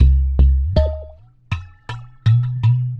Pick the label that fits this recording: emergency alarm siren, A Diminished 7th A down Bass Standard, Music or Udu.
Udu